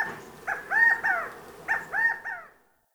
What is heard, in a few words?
Night Creature Medium, Ambience, Night; Night One Offs